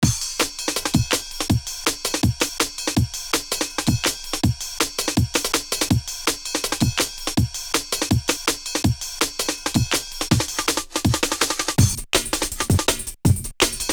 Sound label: breaks, Jungle; Zero-G Jungle Frenzy 1